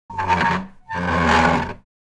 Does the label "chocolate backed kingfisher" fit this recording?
No